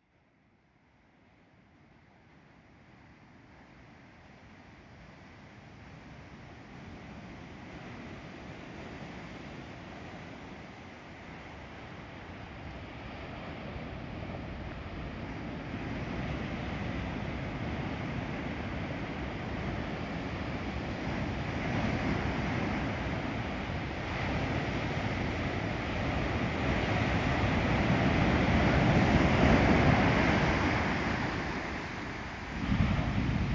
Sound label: Sharp Park surf